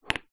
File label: household aftershave or perfume glass bottles x2 slide into each other on wooden; zapsplat